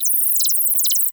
computer compute old school

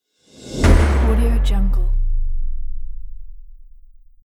cinematic woosh

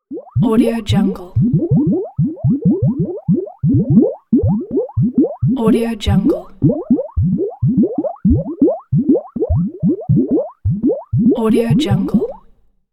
space bubbles

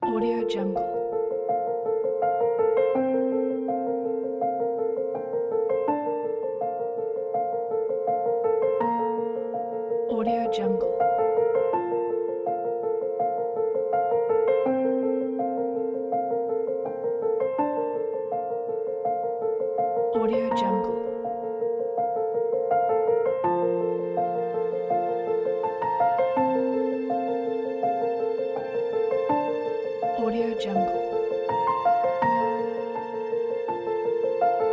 inspiring positive

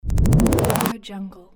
movement transition

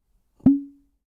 boink wet suction pop blip hollow; prosoundfx

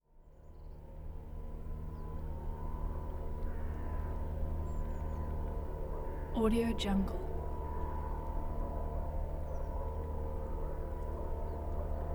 lake ambience with boat

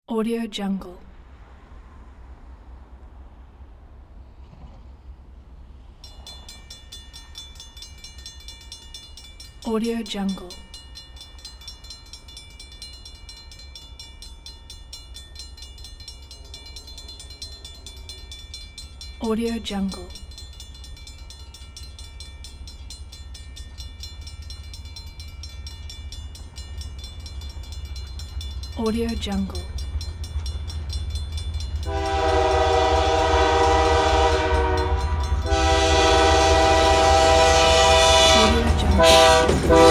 train passing by

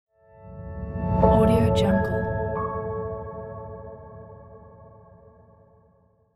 piano logo; empathyimpress

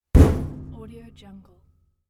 metal cabinet punch

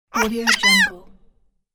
chipmunk joy laugh; fxprosound

